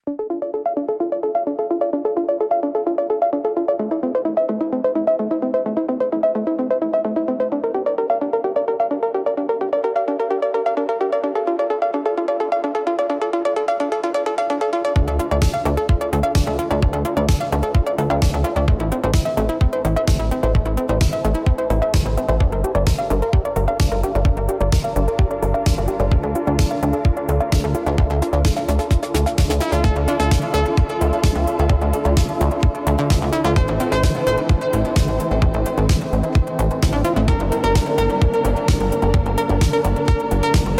Electronic Impulse

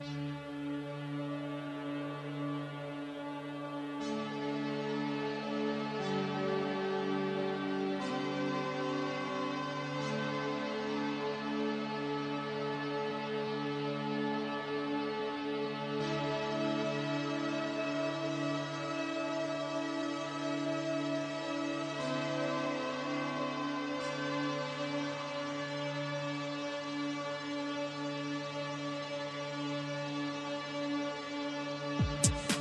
ambient meditation AMBIENT RUSH

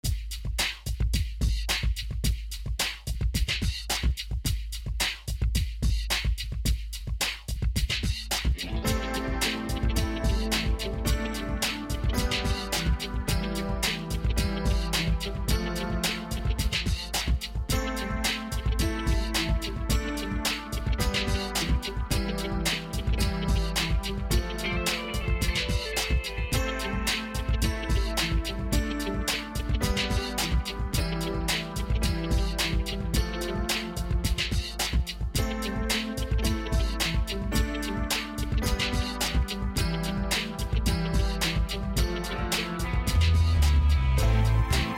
music dance; seven waves

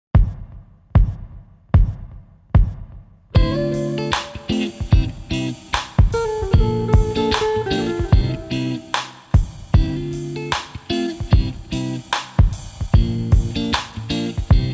stratocaster, 432 hz

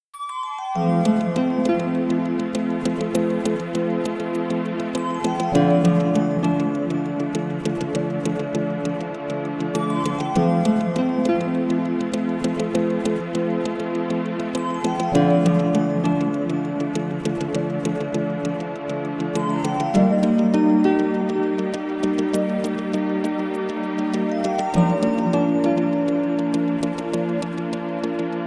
spa music, 432 hz

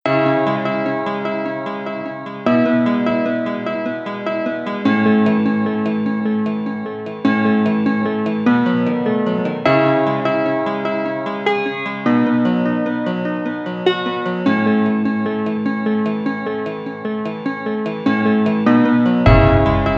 epic 432 Hz

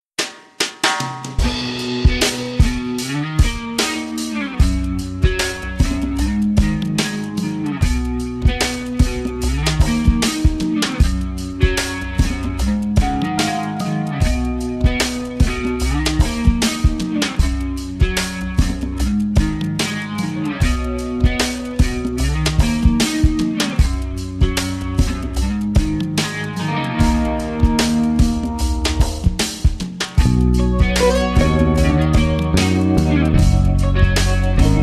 vocally 432 hz

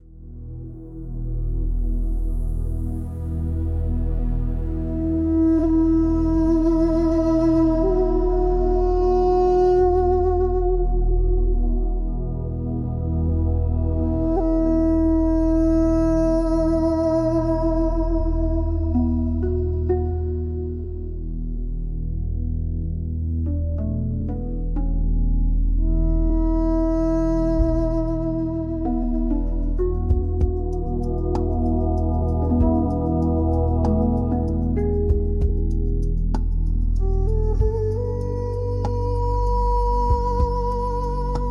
Duduk Dreams

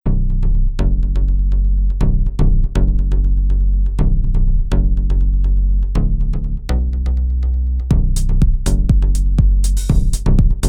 aerobic dance, warm up, 432 Hz; 122 BPM

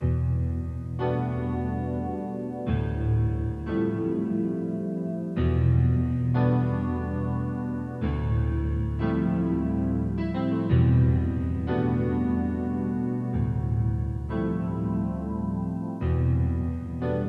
Groovy Chill Funk

